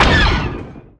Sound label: fire turret; gmod; sw vehicle lfs at te; kingpommes; starwars